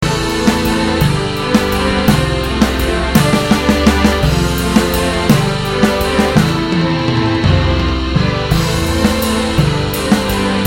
Strings Choir; Syntheway Strings; Magnus Choir

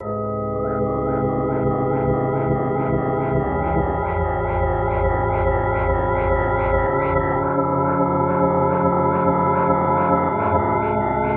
ATMO; SpheratorFM; Psychomotor Impulse; syntheway

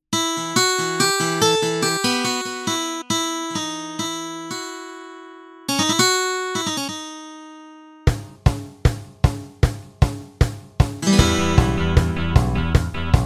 Steel String Hollow Body Electric Guitar, Sitar; GuitarTempus; Syntheway

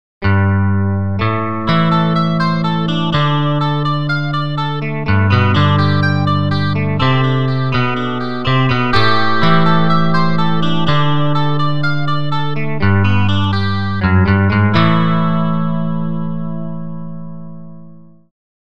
Electric Clean Guitar; GuitarTempus; Syntheway

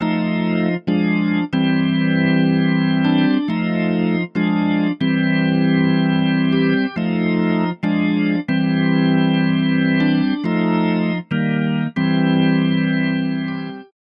Quasi Leslie Speaker Cab Organ Dry; Chorus; Vox Quantizer; syntheway

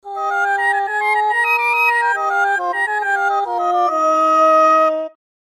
Digital Voice; Magnus Choir; Syntheway

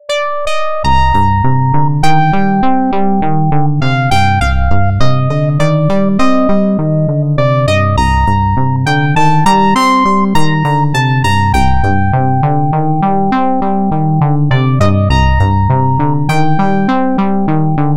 KEYS Digital Quantum; SpheratorFM

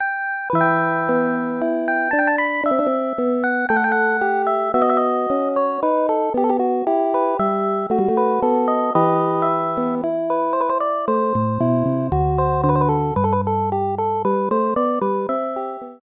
KEY Electric Piano; Synthractive; syntheway

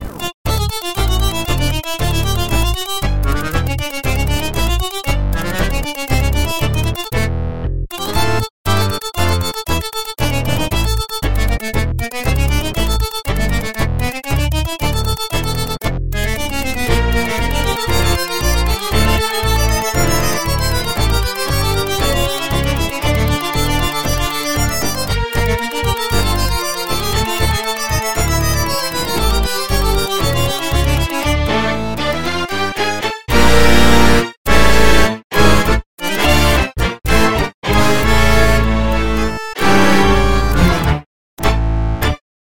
Tango Bandoneon; Harmodion; Syntheway

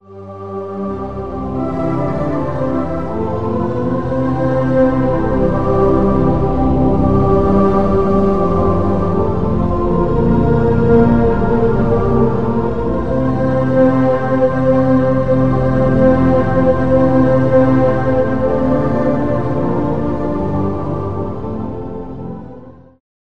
Choralis Pad; Magnus Choir; Syntheway

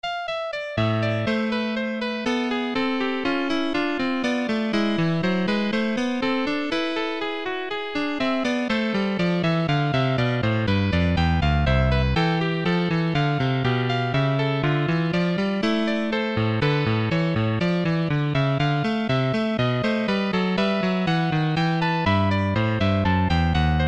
Yamaha CP70; EP; ElectriKeys; J.S.Bach BWV 835; Syntheway

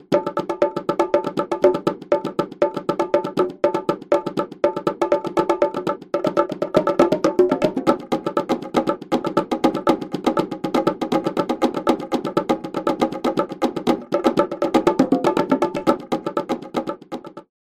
Latin American Bongo; Drumwavy; Syntheway

